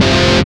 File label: GUI, 100 BPM